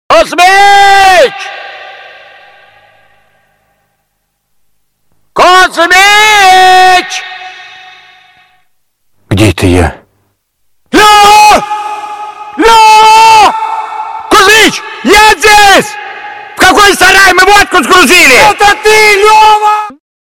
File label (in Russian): krutoj rington na telefon; kuzmich